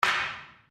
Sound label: Wood On Wood